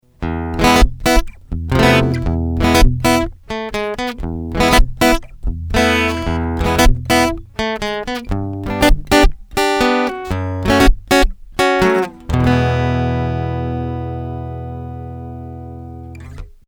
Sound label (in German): Bass Treble angehoben